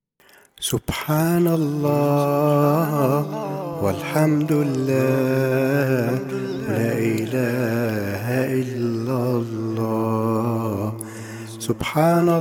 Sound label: Shagara with guitar